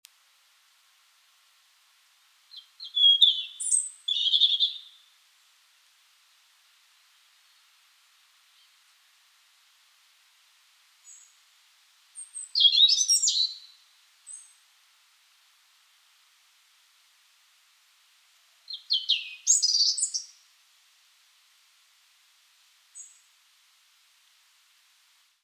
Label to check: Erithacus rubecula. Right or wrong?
Right